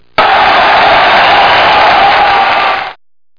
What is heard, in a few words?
CHEER